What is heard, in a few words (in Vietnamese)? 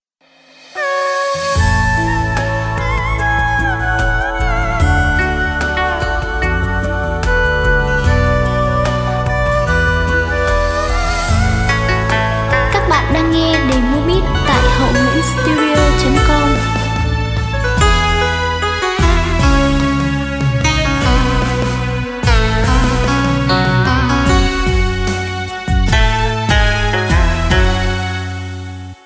beat